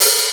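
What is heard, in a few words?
hi-hat jazz open, EMU Orbit-3 drum machine; 300 Drum Machines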